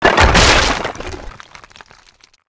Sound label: fence stone wood; World of Tanks Blitz